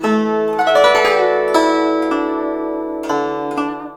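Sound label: guzheng; Spectrasonics; Heart of Asia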